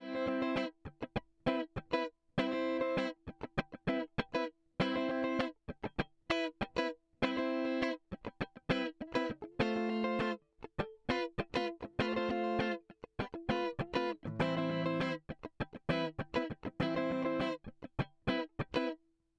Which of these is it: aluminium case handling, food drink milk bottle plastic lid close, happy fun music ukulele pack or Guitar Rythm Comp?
Guitar Rythm Comp